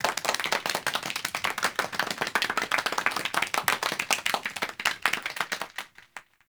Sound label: Theatre Crowd Applause; Warframe; Duviri